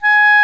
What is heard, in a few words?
Clarinet & Bass Clarinet; OMI - Universe of Sounds; EII Factory Library